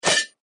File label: attack